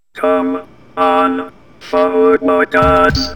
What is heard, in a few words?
drown; quake2; strongbad